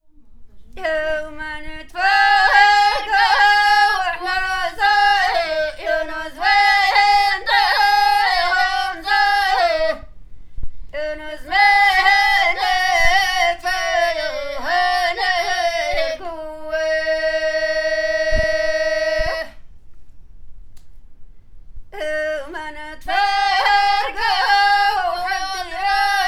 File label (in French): femmes ghomrassen